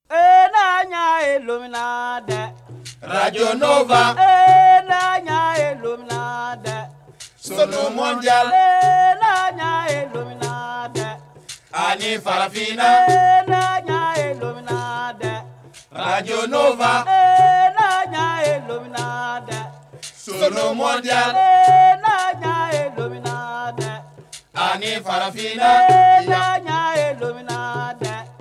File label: Radio Nova Jingle; Nova 25 ans - 1987